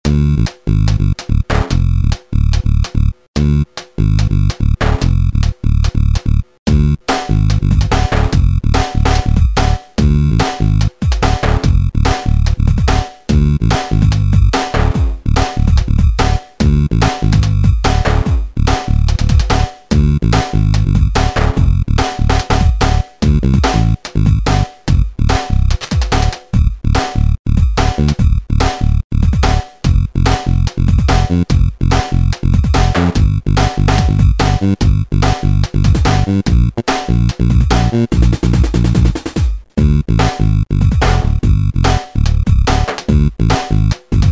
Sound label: Drum-Bass